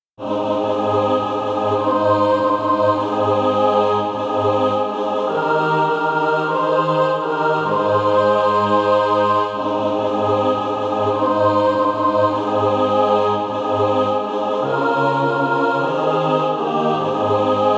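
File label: mixed, Practise Music; Follow Thou Me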